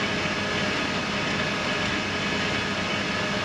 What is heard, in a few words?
Aircrafts Engines DB605 Starter Spin; DCS